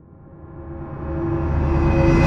Riser

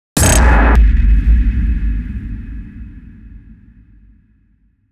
Tension Builder Impact

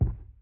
Footstep

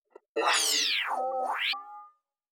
Robo Body Electro Alient High

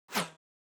Swish Fast; Whooshes

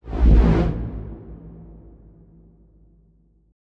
Fluctuating Whoosh; Whooshes

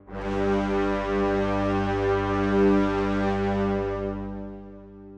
Dramatic Brass

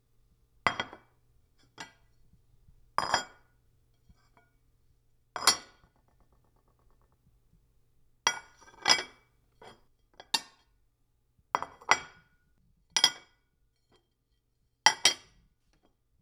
Plates, Cooking

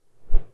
Fast Swish; Whooshes

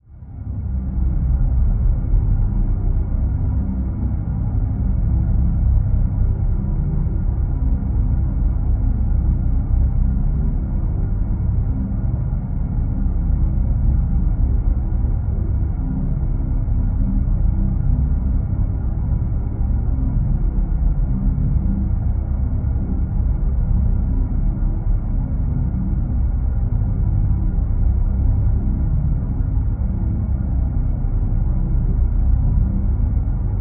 Drone

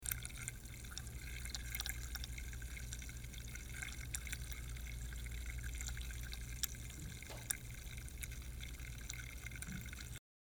Bubbling Soda

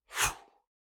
Human Whoosh Fast; Human Whooshes

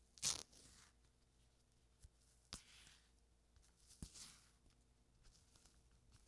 Peeling Banana; Cooking

